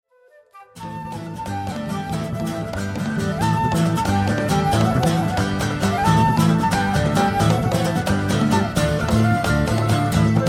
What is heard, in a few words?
Bolivian Mountain Music